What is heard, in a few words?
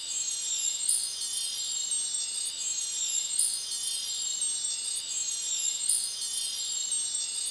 idle, weapons; tfa cso; starchaserar